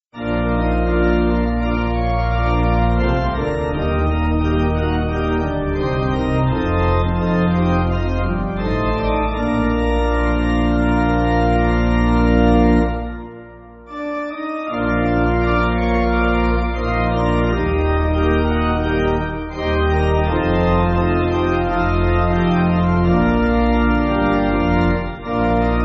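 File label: Pipe, Pipe Organ, Backing Files for Churches; Anonymous; 主耶穌真奇妙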